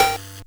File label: Bent Perc